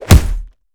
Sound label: punch player; gmod; arccw uc commons attachments